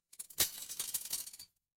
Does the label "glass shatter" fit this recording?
Yes